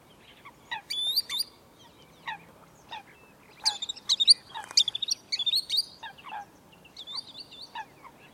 waldwasserlaeufer

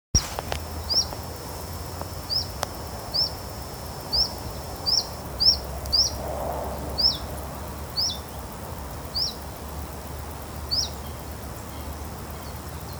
zilpzalp

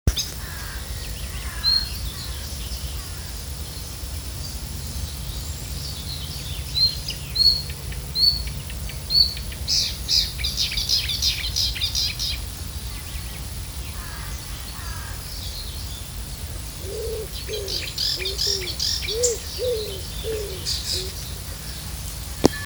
sumpfrohrsaenger

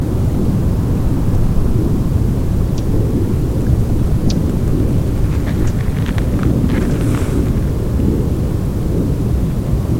dunkellaubsaenger

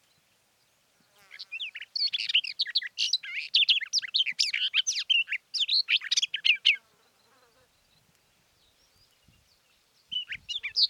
sperbergrasmuecke

